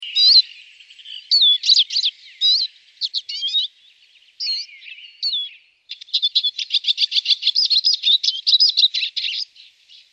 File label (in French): tarin des aulnes